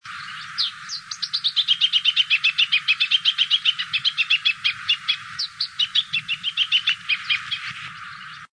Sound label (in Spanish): voz arañero cara negra; geothlypis aequinoctialis